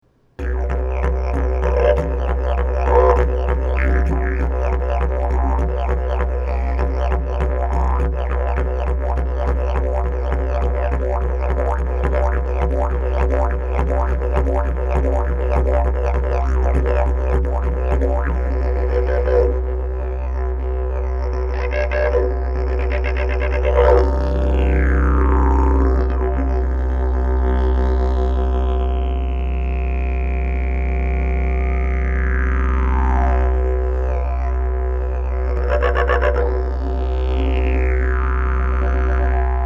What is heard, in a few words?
didge